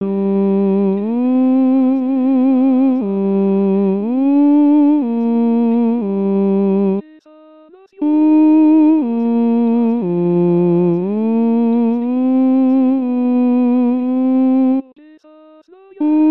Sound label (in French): qu em soi lhevada tenor